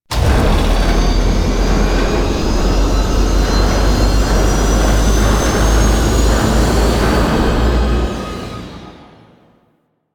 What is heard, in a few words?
Ship Extraction Depart; Grineer Ship Liset; Vehicles Flight